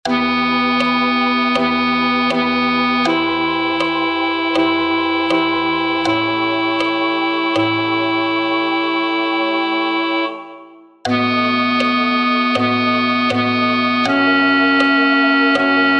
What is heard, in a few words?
Tenor; Vivaldi; Gloria; Gratias agimus tibi